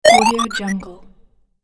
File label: vintage video game powerup